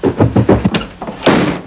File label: AAAARGH; Spanish Net